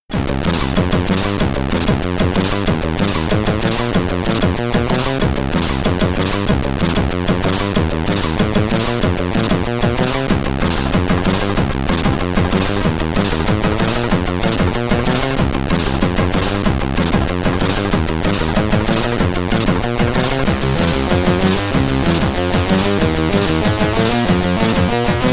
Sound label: Intermission (SID Stereo); Maze Mania; commodore 64